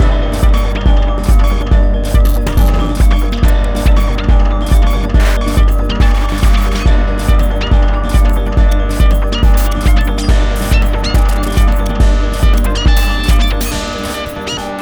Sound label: Gamelan techno Medium